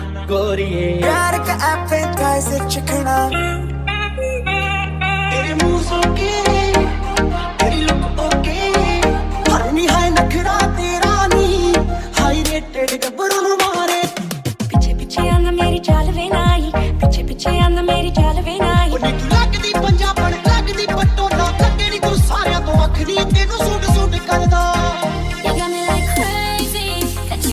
love mashup ringtone

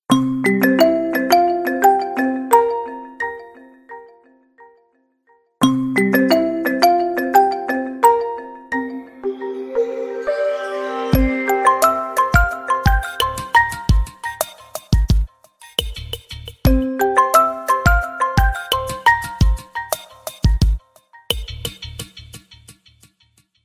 bird fun ringtone; samsung ringtones

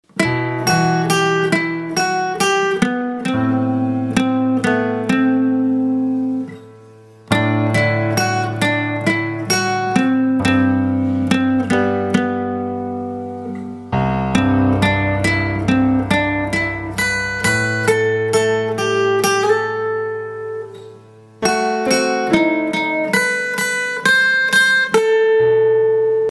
guitar ringtone; aashiqui 2

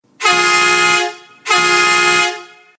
truck siren Pakistani ringtone

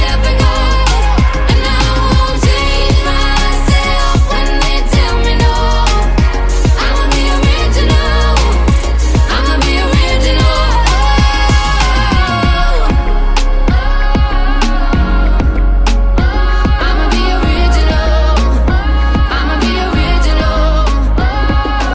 english music

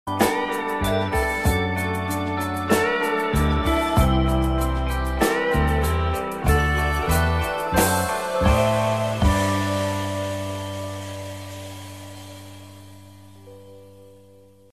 electric guitar ringtone

